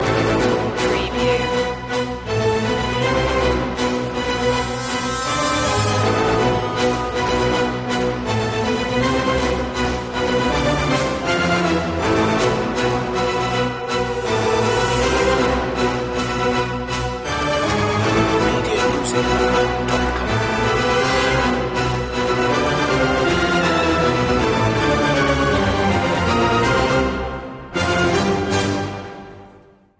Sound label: no choir no perc